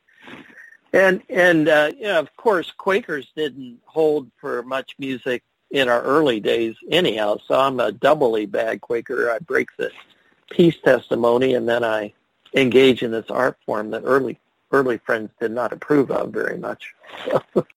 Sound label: quaker music